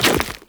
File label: Run Goo, Footsteps; Warframe; Tenno